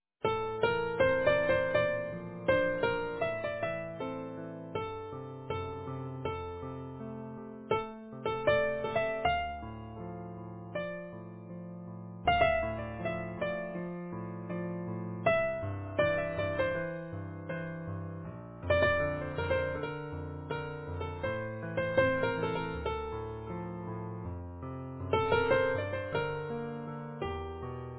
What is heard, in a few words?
easy piano